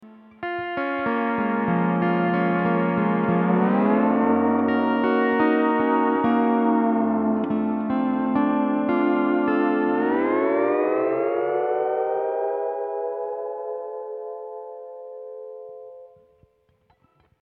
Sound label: hawaiian piece